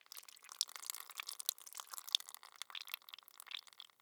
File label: Blood Spill Drip; HauntedBloodlines; SFX Producer